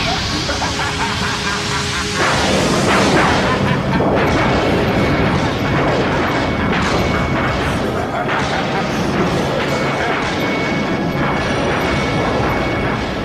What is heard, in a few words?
monster laugh; broly